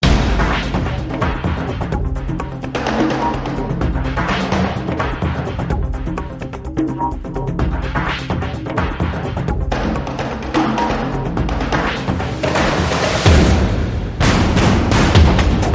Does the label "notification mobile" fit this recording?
No